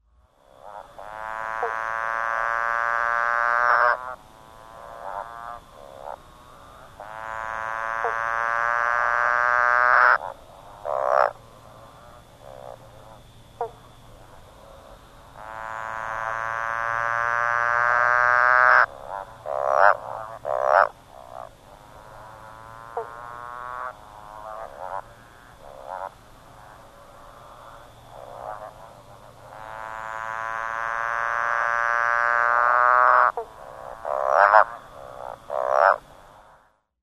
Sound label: Frog Motorbike